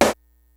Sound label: GREASY KIT, DRUMS; Kid Nepro; Elite